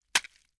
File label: knife slicing flesh stabbing many rapid chops